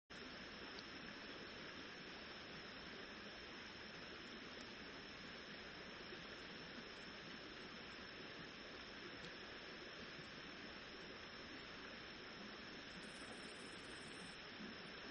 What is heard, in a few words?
riverside; Kyoto U; Ashiu